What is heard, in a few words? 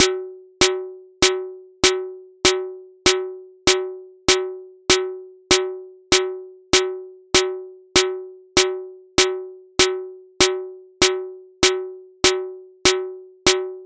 delay snares cr78 brass reso wet; aas; objeq delay